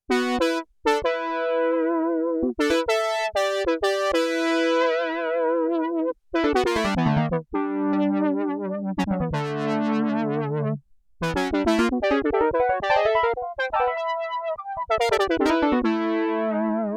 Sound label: BRASS 5TH